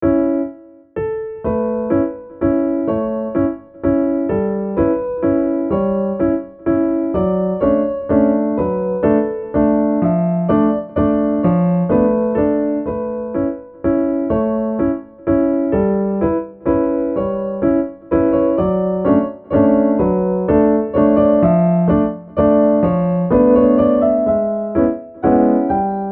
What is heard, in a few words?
Waltz